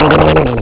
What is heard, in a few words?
sleep; ST-XX Amiga Soundtracker Sample Packs